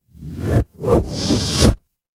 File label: transition whoosh